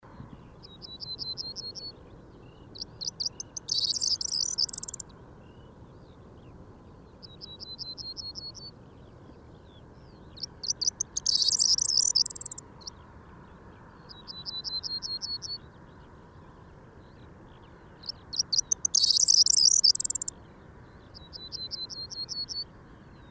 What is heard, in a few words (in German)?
Grauammer; Garchinger Heide; Susanne Rieck